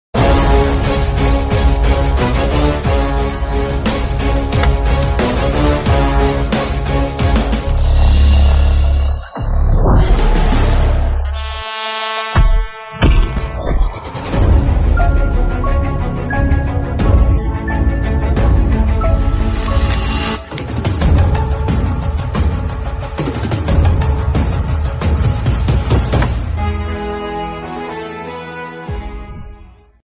ringtone bgm south movie ringtone bgm calling ringtone; check movies; nitin